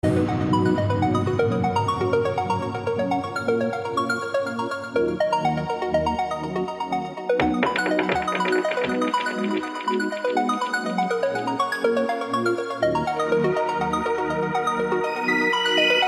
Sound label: instrumental mix